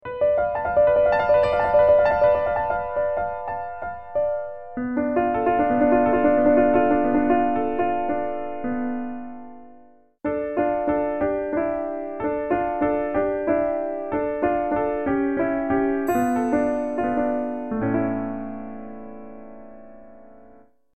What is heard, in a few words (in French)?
septieme min